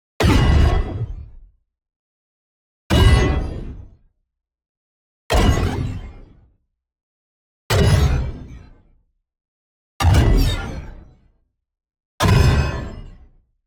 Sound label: HIT LARGE Ion Bass; NEON; boom library